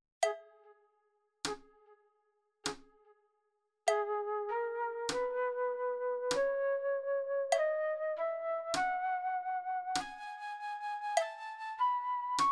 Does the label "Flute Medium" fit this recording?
Yes